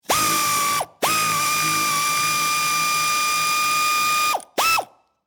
Air Ratchet Screw, Industry, Air, Ratchet, Screw, Bolt, Into, 4X4, Long; Air Pump